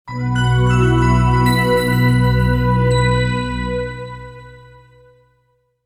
Startup, Computer: Short Musical Style Phrase; Miscellaneous Musical; Stuart Duffield

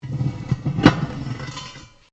METAL ON METAL LARGE SCRAPE; Metal (Sound Effects:Materials)

